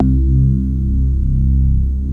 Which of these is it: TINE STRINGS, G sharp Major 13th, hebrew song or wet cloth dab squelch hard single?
TINE STRINGS